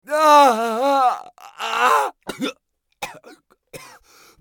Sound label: bear death; eft